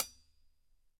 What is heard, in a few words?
HH Closed